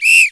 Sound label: whistle medium